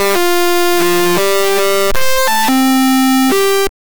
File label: a slightly different, blip